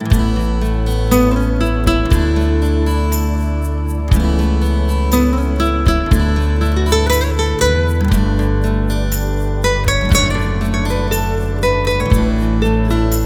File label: 120B